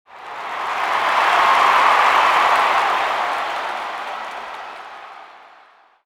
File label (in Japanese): kansei